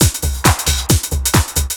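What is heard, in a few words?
drum loop 135, right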